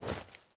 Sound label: samurai armor; smite; odin